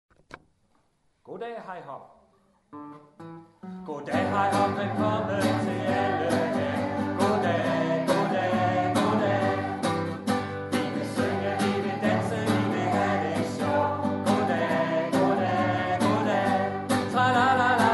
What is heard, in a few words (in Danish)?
Sang